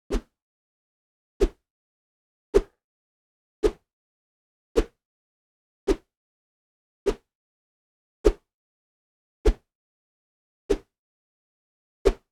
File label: Whoosh; Effets Sonores